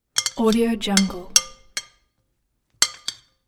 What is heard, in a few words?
plate; chicasound